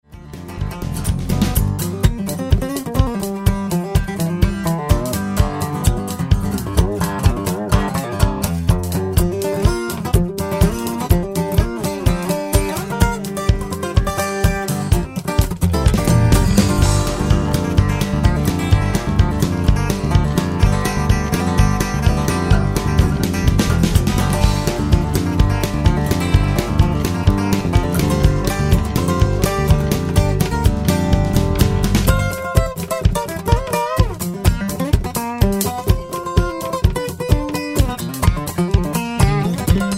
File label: countryfunk